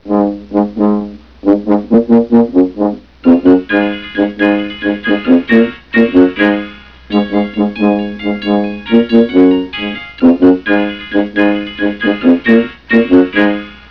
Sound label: tuba